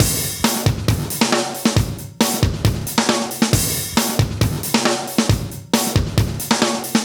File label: 136 live breaks